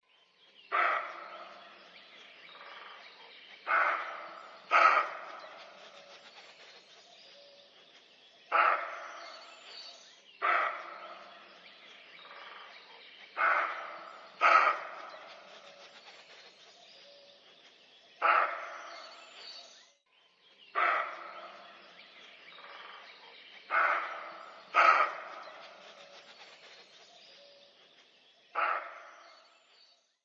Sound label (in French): Le cri du chevreuil